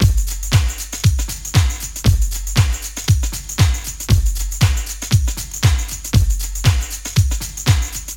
118 bpm 90s pop drum beat g key, Free breakbeat sample - kick tuned to the G note; drum loop